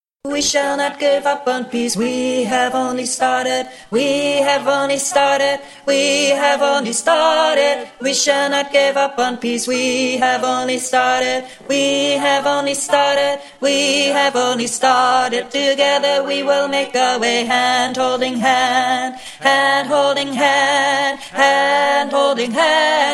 tenor

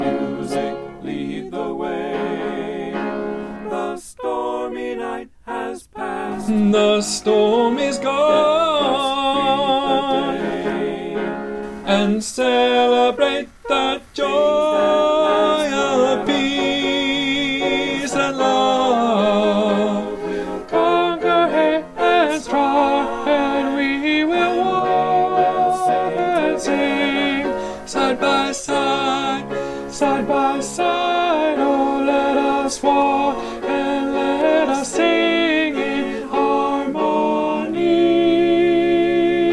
solo tenor

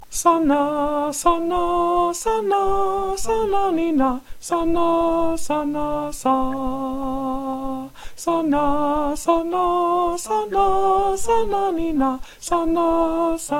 alto

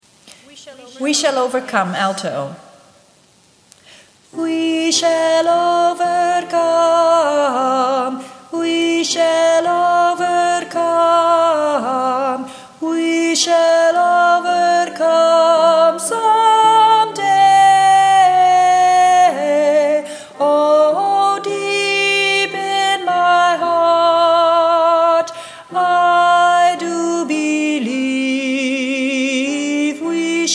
We Shall Overcome sung Alto

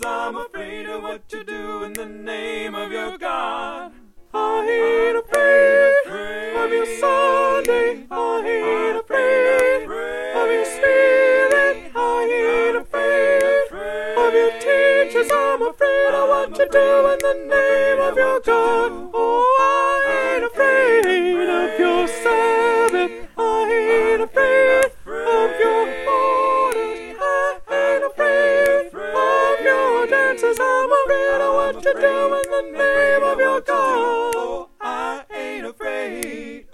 descant soprano

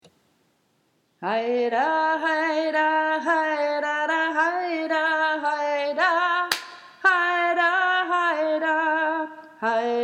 Haida round accelerating

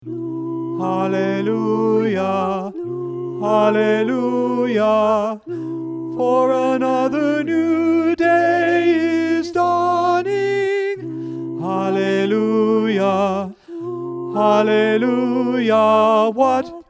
Tenor high; 2023 Fall Repertoire

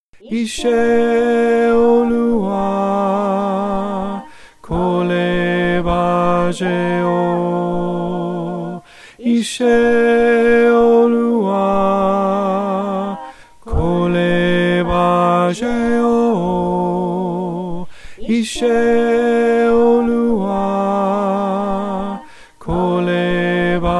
Ise Oluwa bass

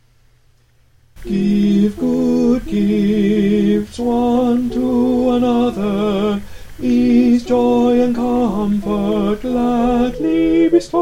tenor

